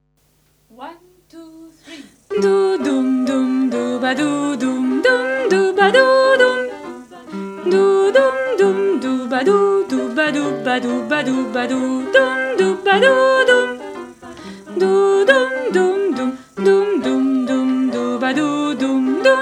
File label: Bourree bass